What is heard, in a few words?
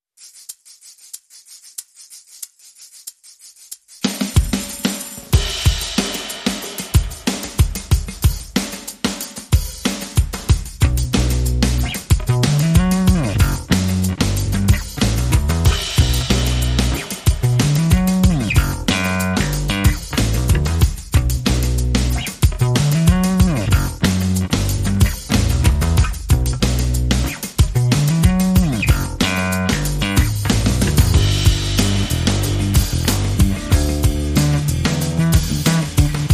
Drums and Bass Mix